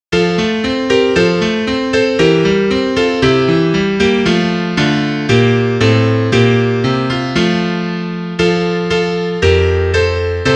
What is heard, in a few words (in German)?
Klavier; europalied